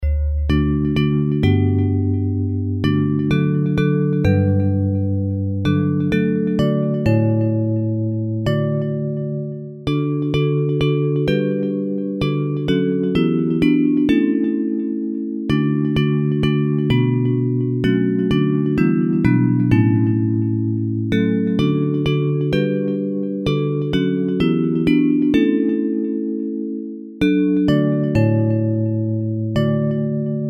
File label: Bells, Hymns